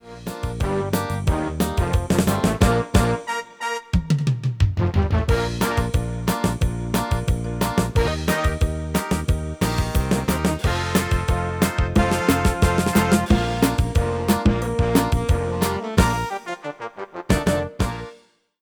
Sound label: Happy Music; songpakete demo